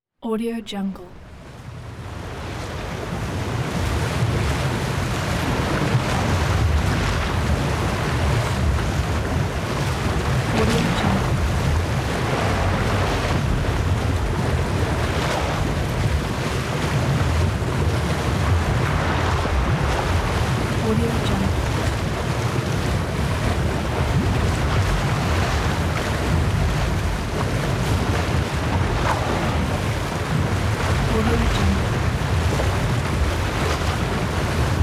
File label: seething water